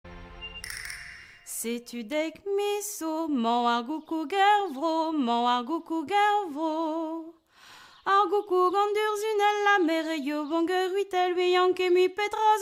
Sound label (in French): chant Breton; Chant breton : Ar goukoug